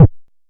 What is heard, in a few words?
analog quick, Kickbank